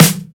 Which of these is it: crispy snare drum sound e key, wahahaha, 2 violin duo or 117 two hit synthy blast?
crispy snare drum sound e key